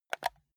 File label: console controller home press fast